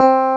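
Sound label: Clavinet soft C2; USB Soundscan vol.09; AKAI; Keyboards Old School; CLAVINET1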